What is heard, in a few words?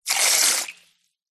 human spit water out into metal bowl medium amount